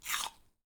Apple Crunch

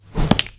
Kick Broken Bone

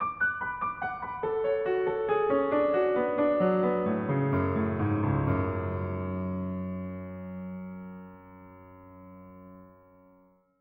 Short Finale

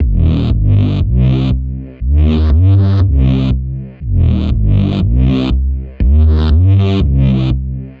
Lfo Bass 120 2bar Loop

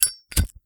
Zippo Lighter Open Light

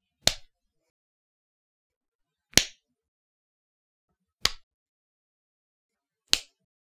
Slaps

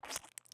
Squelchy Squirt

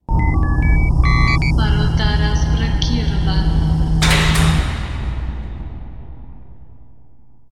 Computer End

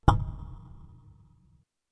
Table Tennis Ball Bump

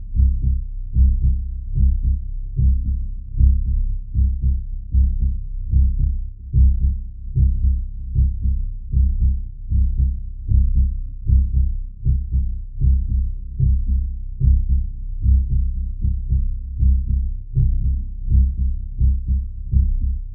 Genuine Heartbeat Bass

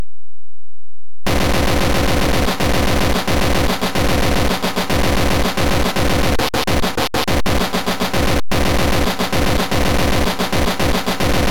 Pounder

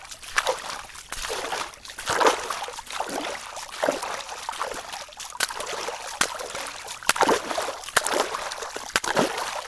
Walking In River Water Loop

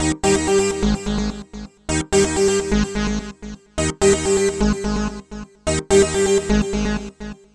House 127bpm Arp

